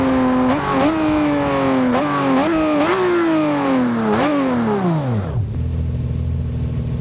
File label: throttle off, vehicles; aventador